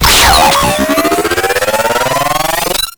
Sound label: Bomb Charge Up; Warframe; Missions; Sentient Defend